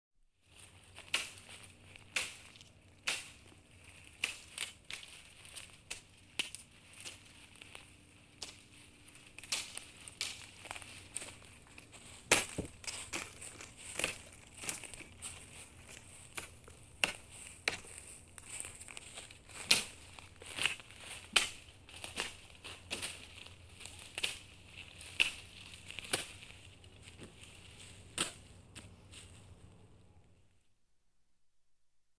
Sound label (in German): durch gestrüpp marschieren; Urwald